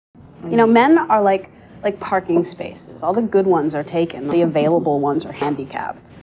jazzy

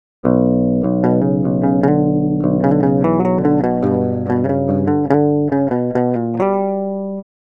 bridge, guitar

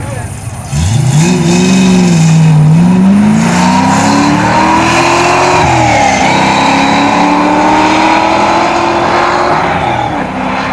mustang

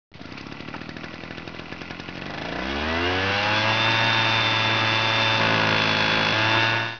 mower; Lazy Lawncare

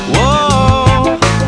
woah

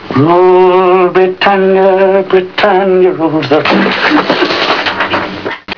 bathtub

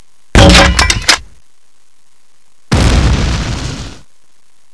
grenade launcher

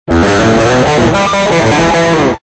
undamped

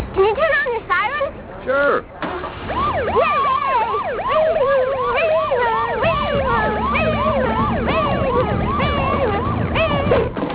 siren

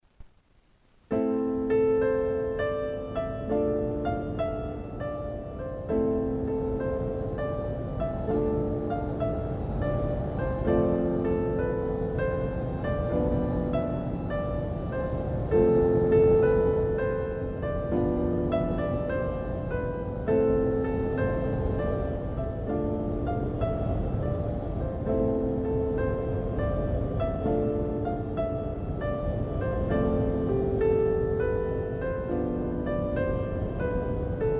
Raindrops

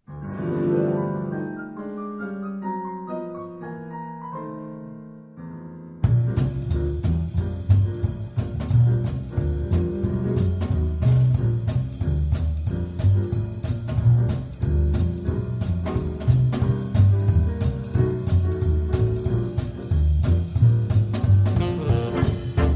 riff

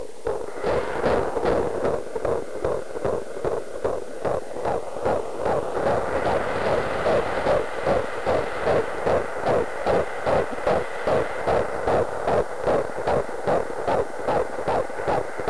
beat

